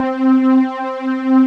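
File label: synth strings, JV 1080; Pro-Rec - Synthsonic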